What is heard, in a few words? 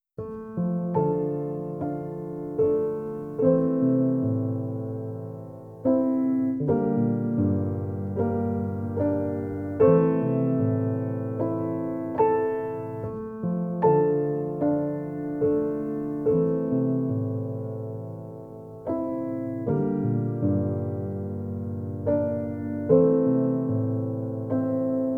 American Wigeon, Piano Preludes